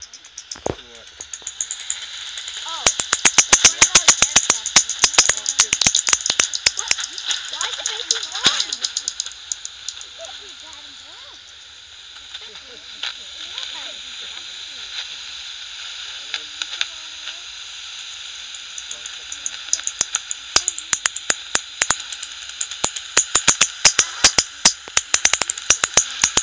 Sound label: bat walk, Carlington Woods; NatureBlitz